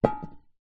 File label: tin lid metal thin small drop carpet; foley